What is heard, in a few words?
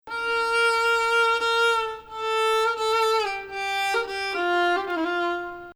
Bayati Samai Ajam 6; could also call "2nd Ajam 3/6"; tonic is 6, but note span 3-6; Samai Bayati il-3ariyan